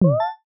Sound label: toggle soft on; buttons ui